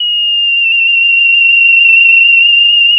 pop, sound of a tea kettle whistling

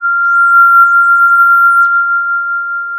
Sound of a police car siren

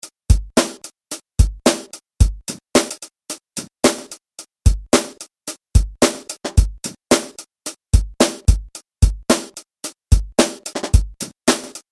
kickin drum kit

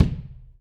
Acou Kick, drum

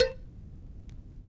Vinyl Perc

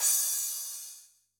Crash, drum

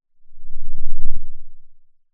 Sound of a lion’s roar

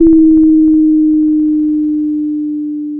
pop, Sound of a doorbell

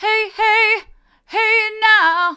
hey now hard, vocal

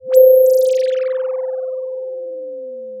Sound of a phone ringing

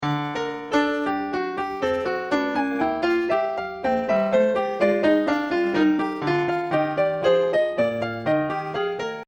piano

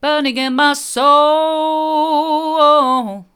burning in my soul hard, vocal

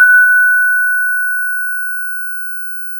pop, Sound of a church bell

